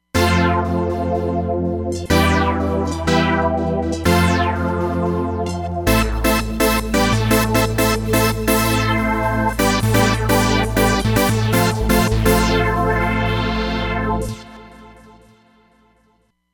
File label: Trance Sweep; Roland Fantom XR